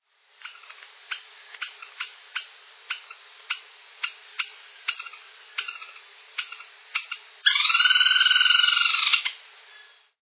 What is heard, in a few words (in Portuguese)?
cabecudo